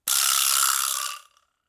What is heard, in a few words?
Vibraslap D Pan; Latin Percussion; Drum Hits; Wood; Ableton; Factory Packs